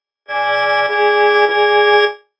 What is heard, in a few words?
violin